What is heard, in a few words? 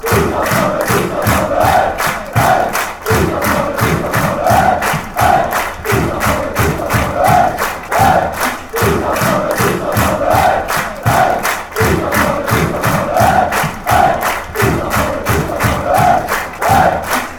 all together, fan chants